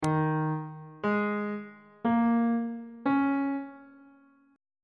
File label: d sharp dominant 7th suspended 4th chord intervals on bass clef